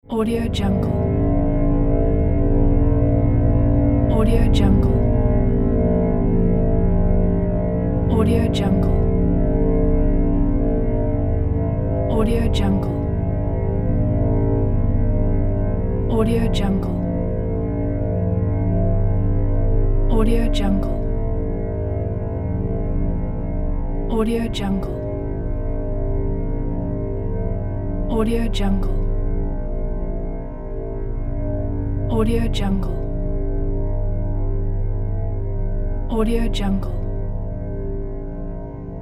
background drone horror film

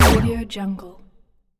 gun blast; bogdansfx

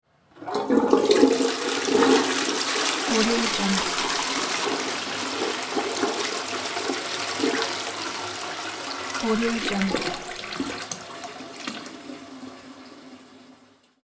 toilet flush

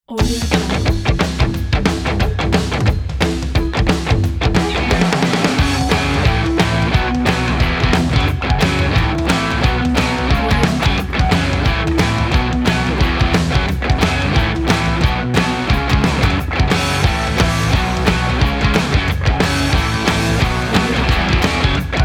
the indie rock

